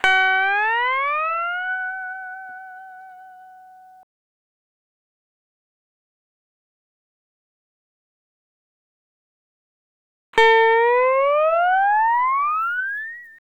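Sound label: Sunrise Guitar Fx E Dry, Lounge; Zero-G Kocktail Kollection; Construction Kitz (Lounge); Sunrise Mixes 082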